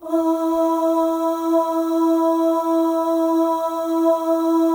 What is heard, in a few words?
OH, FEM, Symphony of Voices